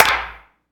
verby steel snare drum a key, Royality free steel snare drum tuned to the A note